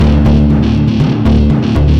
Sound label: fire backing track 120bpm; Adventure Time - Elemental; ThrillGames